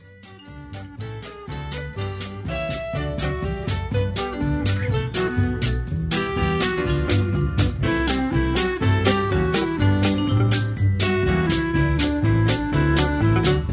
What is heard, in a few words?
Instrumental with Melody